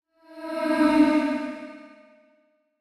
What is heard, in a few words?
130bpm Am, Vocalpack